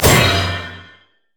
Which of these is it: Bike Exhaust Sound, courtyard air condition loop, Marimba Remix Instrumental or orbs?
orbs